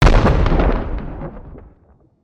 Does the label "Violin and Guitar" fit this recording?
No